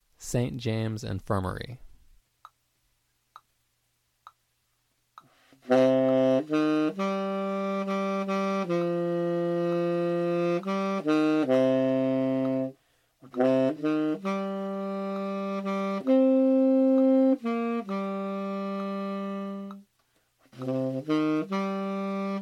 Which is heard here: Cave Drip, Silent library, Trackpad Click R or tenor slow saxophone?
tenor slow saxophone